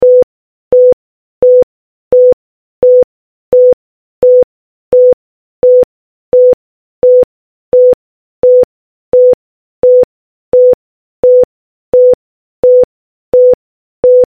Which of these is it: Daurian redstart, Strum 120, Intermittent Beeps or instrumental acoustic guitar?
Intermittent Beeps